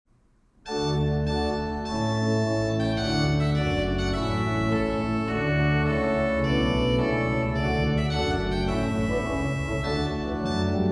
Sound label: trumpet tune; henry purcell